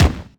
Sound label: march on beat; HeavenStudioPlus; lockstep